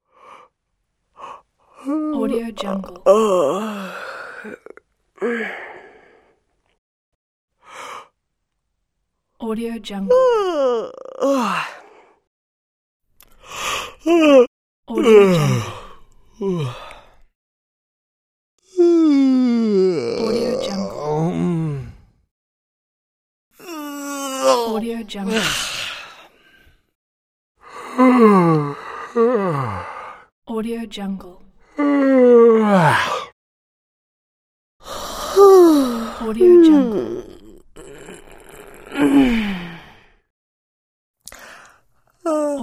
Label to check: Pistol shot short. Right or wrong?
Wrong